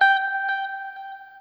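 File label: noodle short, guitar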